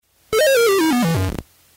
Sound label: Exit Level; ringtones; Gauntlet